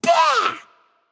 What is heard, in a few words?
grunt attack, voicepack; smite; jorm